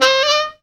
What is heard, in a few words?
upper sax; Zero-G; Funky Elements